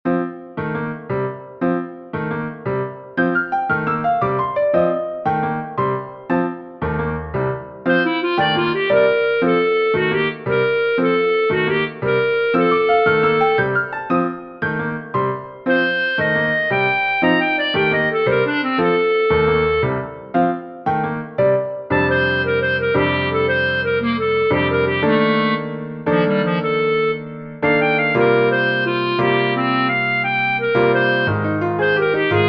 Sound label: duet for clarinet and piano